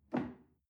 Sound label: chair wood take soft